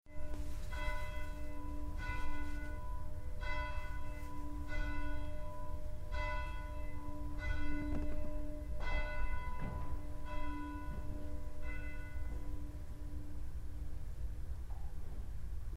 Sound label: bells